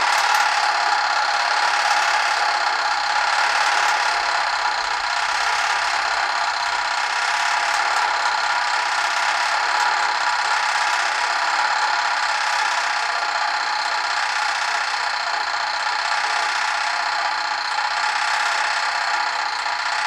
toaster

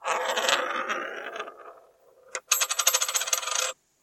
coin roll

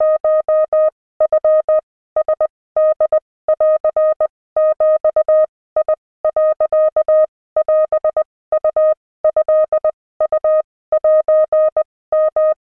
morse; 電子音

